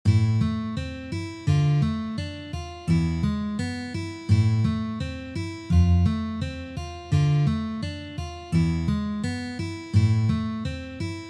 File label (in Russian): variant perebora